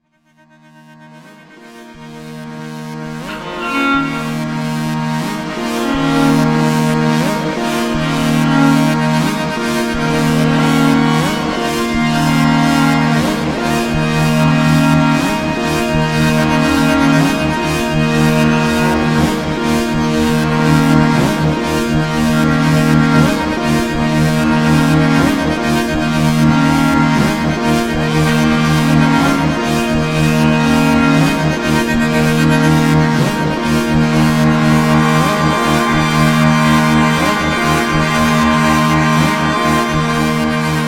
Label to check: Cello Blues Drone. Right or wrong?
Right